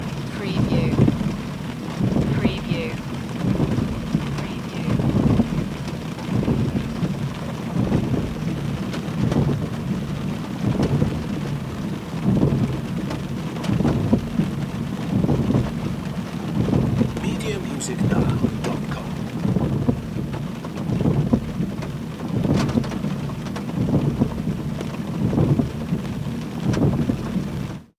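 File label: Windshield Wipers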